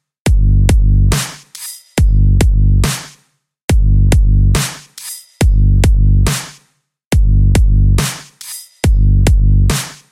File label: EDM Ringtone